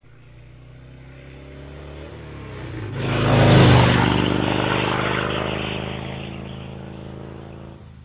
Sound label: motorcycle